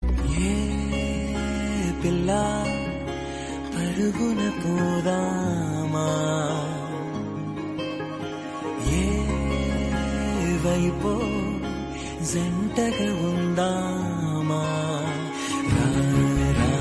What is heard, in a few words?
Telugu Song